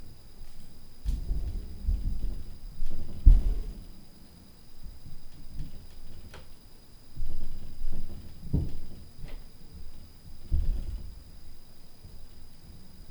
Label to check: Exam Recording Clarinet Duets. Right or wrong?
Wrong